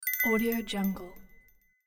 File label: little bell ding